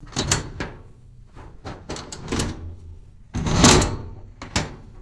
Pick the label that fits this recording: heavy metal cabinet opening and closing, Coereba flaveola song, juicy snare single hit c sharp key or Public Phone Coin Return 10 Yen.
heavy metal cabinet opening and closing